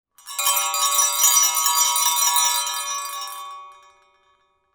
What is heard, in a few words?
bell ringing